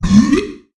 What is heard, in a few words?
Monster Spit